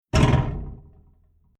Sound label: Domestic Household Radiator Impact Metal Rattle; Miscellaneous Impact